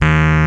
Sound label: RHODES D#3; Vintage